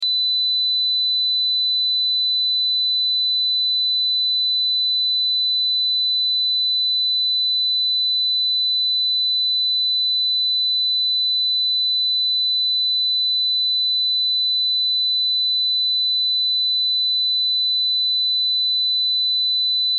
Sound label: Test Tone Square; Wave 4Khz 60 Secs -18Db Loop; Blastwave